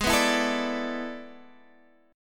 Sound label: A Major 7th Suspended 2nd Suspended 4th